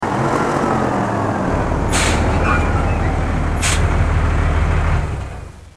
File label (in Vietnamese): tieng do xe tai lon